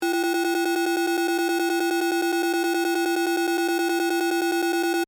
Telemetry Alarm, Scifi, Telemetry, Alarm, Electrical, Loop, Science, Fiction; Communication Sound Effects; BlastWave